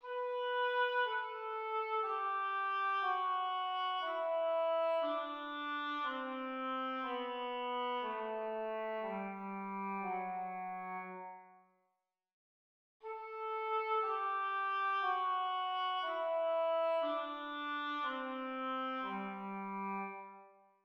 scales

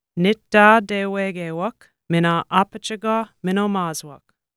they drum

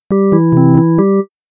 sound nudge

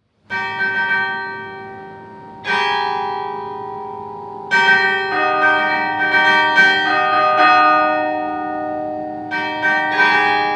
Carillon Typing Inside and Outside

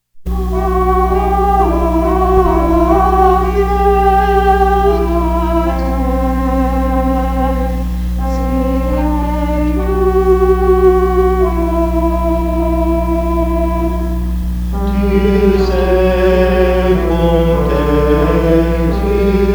choir; papyrus

